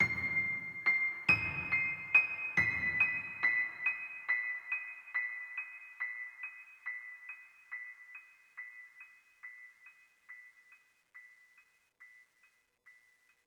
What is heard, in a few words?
One shot Piano